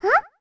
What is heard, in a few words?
Baby Goron Huh; Baby Goron: "Huh?" (3 pitches)